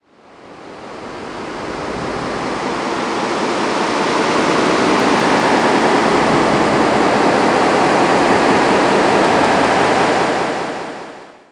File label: sea sample